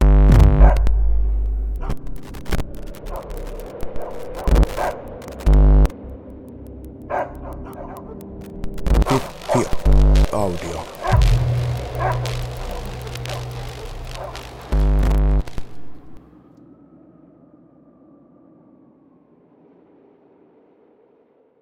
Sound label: jingle